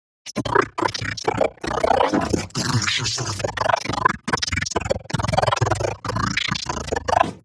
138 Shamanic Voice (Wet FX), Psytrance; Shamanic Voices

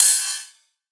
Ride, Cymbals, Drum Shots; Psytrance; Singomakers; Psytrance Mantra

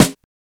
snare; Singomakers; Psytrance Mantra; Drum Shots

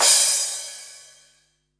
Crash, Cymbals; Minimal House

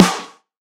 Snare, Psytrance; One Shots; Claps Snares

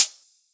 Hihat, Psytrance; One Shots

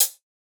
HiHat, Psytrance; Drum Shots

